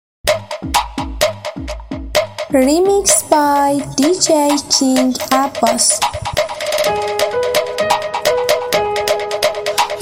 remix remix

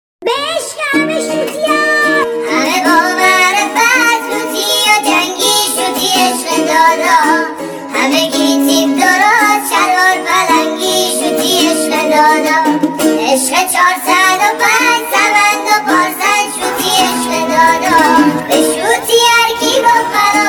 mazandarani shoti lati

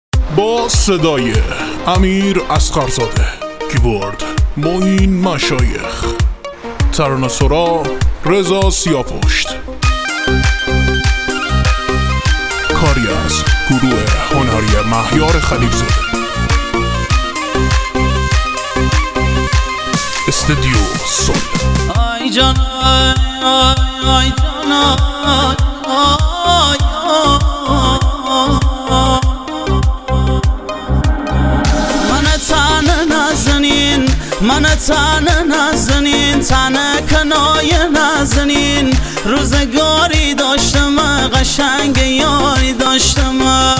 music lati mazandarani

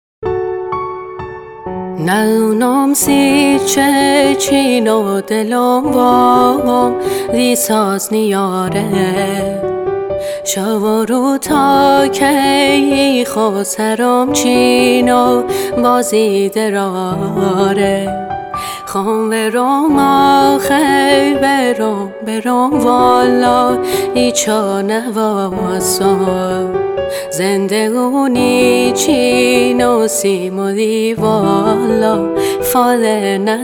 lori ghamgin khoram abadi

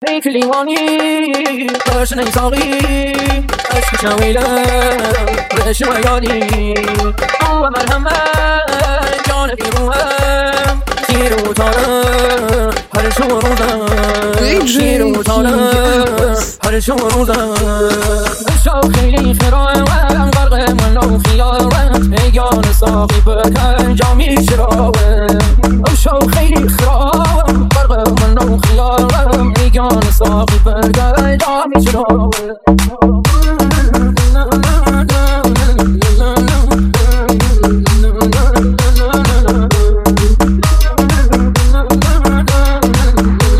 remix remix

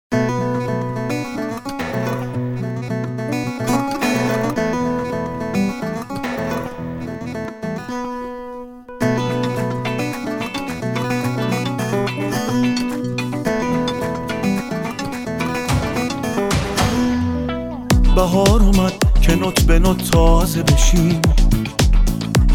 noroz remix

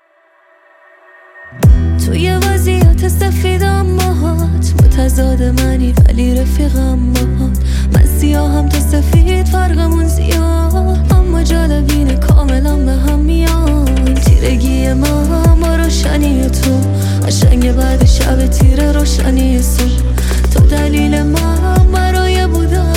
ahaghaye jadid asheghane

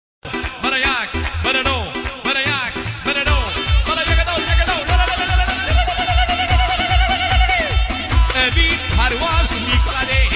shoti lori

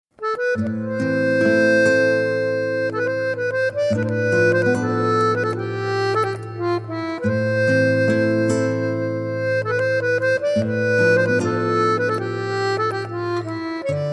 ghamgin bikalam torki

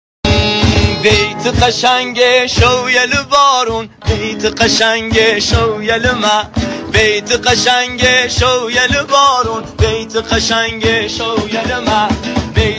music lori

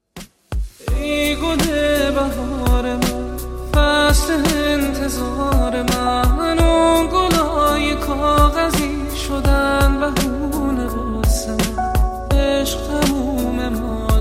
ghagintarin ahange donya

